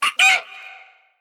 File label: win Pelican; jumanji